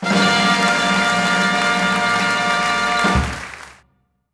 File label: winner ta dah horns oneshot cheers; overte; lastAvatarStandingTrivia